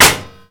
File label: knife metal; cso; tknife